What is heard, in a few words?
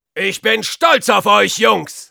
cheers, de